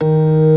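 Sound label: ORG. D#3; Best Service; Advanced Media Trax Modern Composer; B3 FULL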